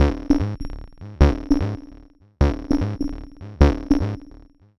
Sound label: perc 100, Percussive Loops, 100 bpm; Sample Magic - Transmission-X